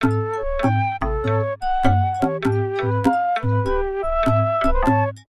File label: Musicblock